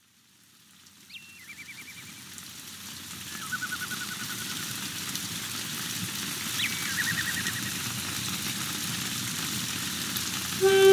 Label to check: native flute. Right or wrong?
Right